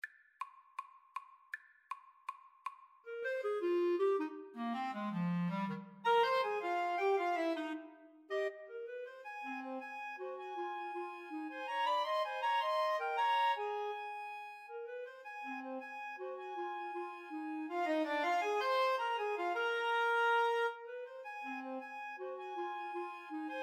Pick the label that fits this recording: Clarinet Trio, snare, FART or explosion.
Clarinet Trio